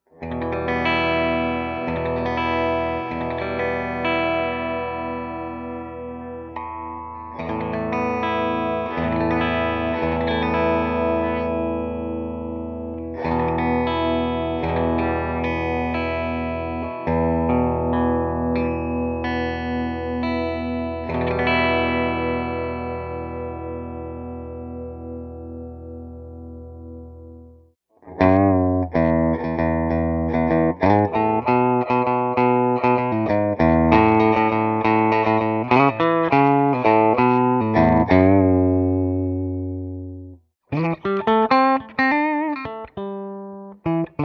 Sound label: bridge clean